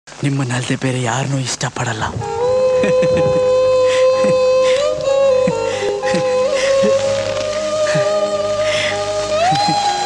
mungarumale sad violin bgm

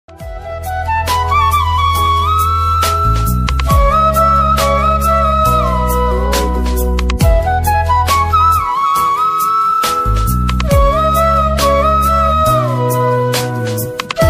instrumental ringtone; geeta govinda

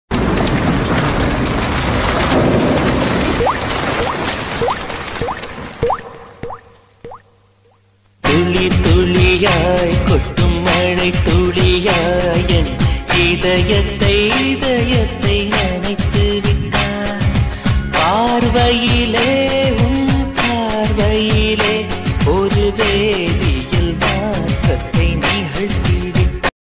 love bgm ringtone, south indian bgm ringtone

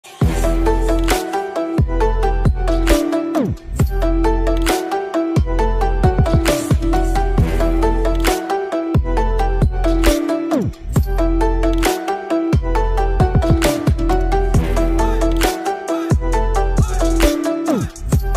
theme remix ringtone; death note

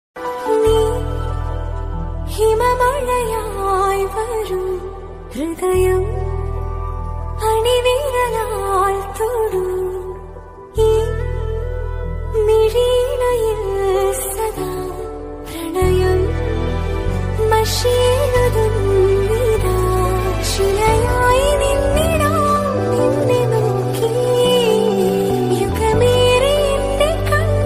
feeling ringtone bgm; malayalam english tamil

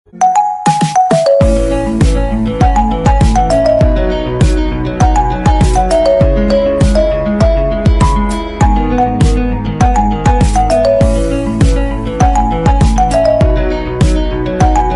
instrumental ringtone

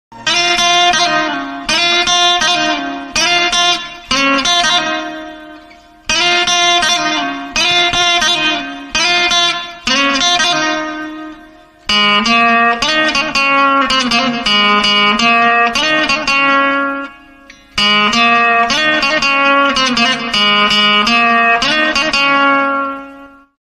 odia heart touching guitar ringtone; Siddhanta Mohapatra

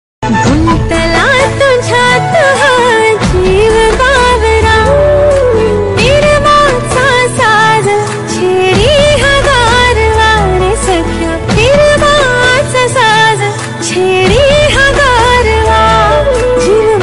marathi ringtone bgm marathi ringtone

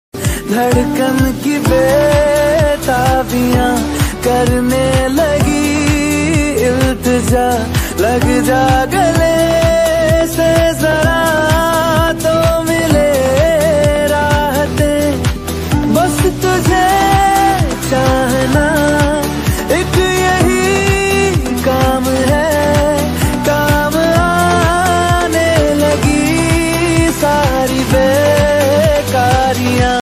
love ringtone

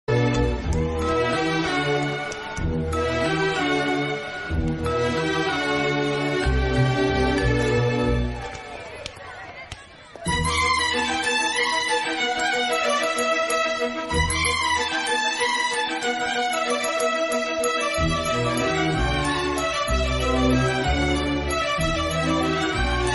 sad bgm instrumental ringtone; vallavan

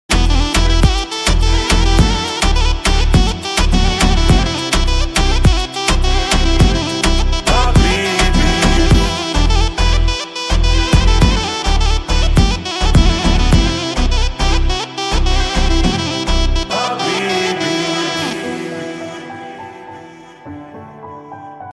albanian remix ringtone

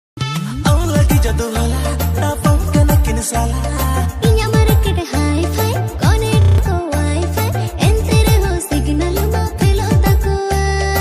song bgm ringtone

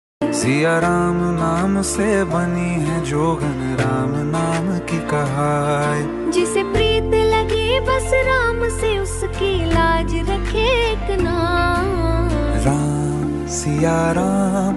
ringtone bhakti ringtone; sitaram ringtone

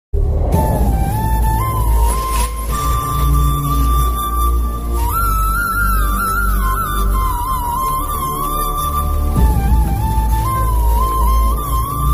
climax emotional bgm ringtone sad bgm; Sita Ramam

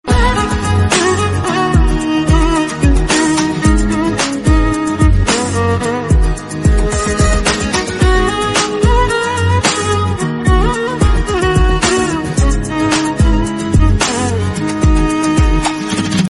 love bgm; SR Kalyana Mandapam

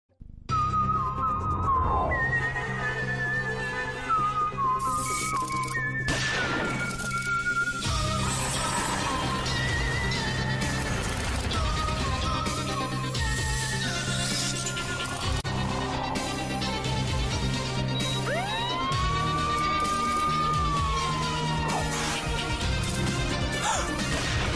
theme bgm ringtone

